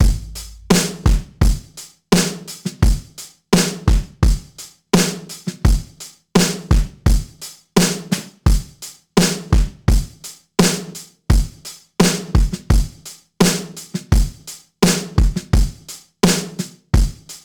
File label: drums